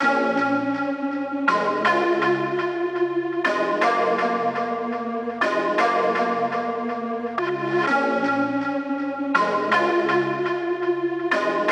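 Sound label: Main Melody, Acapella Kit 122bpm A# minor; Construction Kit; Ghosthack; Summer Freebie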